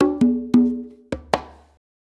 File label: Blues Perc Loop